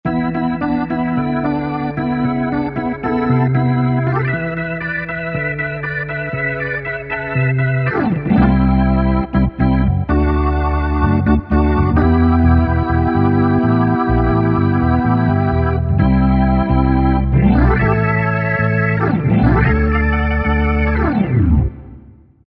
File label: Full Rocker, organ; Factory Voices